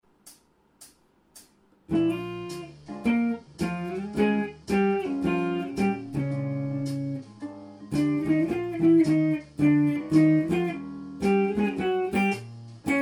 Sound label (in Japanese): okuta bu souhou jazz blues